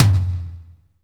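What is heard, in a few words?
tom, real mega drums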